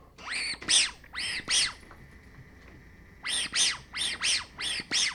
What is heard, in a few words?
Squeaky Toy